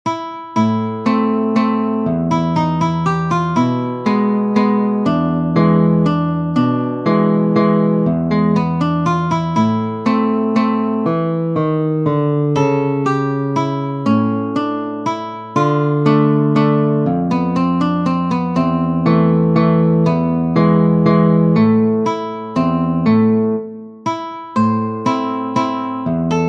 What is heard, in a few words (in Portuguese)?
valsa, guitar